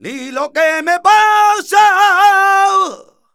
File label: voc d#, Flamenco Vocals Male Cantaor; Male Cantaor 1